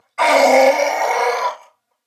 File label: Death Corpus Venus Male, en; Dialog; Barks; Battle; Warframe; CorpusVenusMaleB